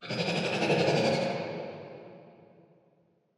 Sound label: Spider Spitter Scream Distance; Deep Rock Galactic Boardgame